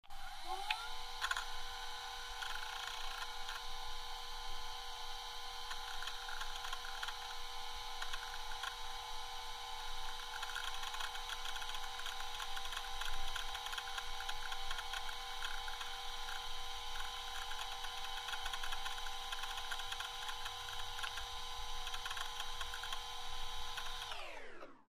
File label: Laptop Boot Up Shut Down